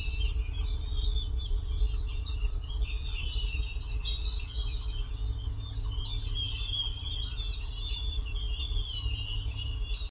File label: Bird demons